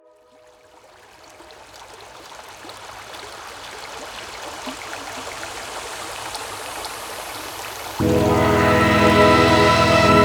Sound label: Tonal Meditation